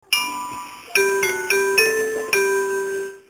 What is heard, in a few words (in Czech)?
znělka; Ústí nad Labem západ